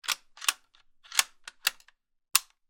warfare gun mosin nagant rifle cock dry fire; sound effects one

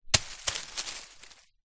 impact rock small land amongst leaves bushes

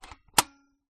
toy stereoscope plastic lever release

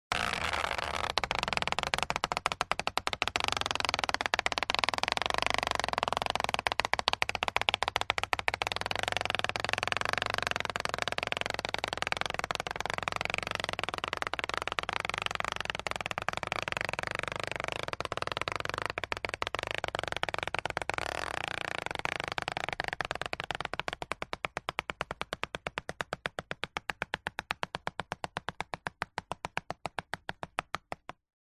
nature water drips fast heavy on glossy paper

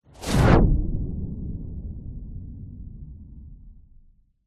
fantasy magic spell dark ultra fast pass whoosh

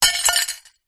impacts dish ceramic drop smash

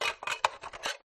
tray plastic small remove from toy; skyclad sound

